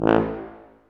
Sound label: TUBA, Tuba stacc; Roland L-CDX-03 Disk 2